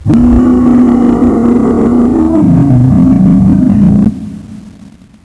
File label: venomguard howl, zombie; zombie evolution